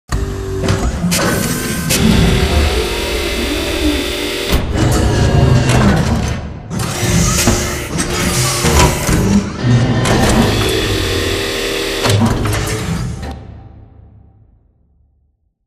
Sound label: Assembly Furniture Arm Close; Boomtown; Titanfall 2